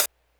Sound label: hihat; Big Fish Audio; Hardcore Breakbeat Action